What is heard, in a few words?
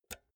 pull out jack multi socket fast; objekte; elektronik